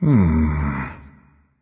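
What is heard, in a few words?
thinking, nonverbal; smite; khepri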